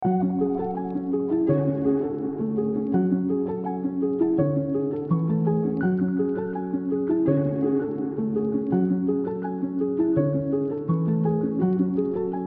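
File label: F minor 166 BPM Piano Keys Texture Lead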